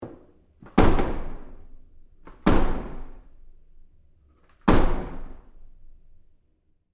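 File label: Door Bathroom Stall Impact Close Up Bounce; Big Room Sound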